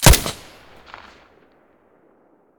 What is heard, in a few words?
silencer mono, weapons